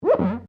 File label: GLASS SQUEAK FINGER